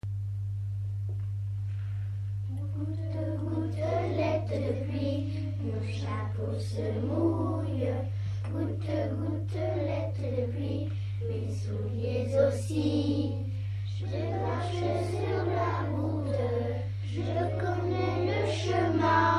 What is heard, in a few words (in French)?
chant